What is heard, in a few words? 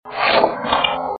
noise; massachusetts; middleborough